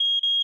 alarm; Golden Crayon Sounds

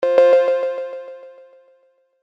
error; Original TK Sounds

